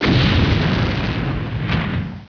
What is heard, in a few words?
explod, ambience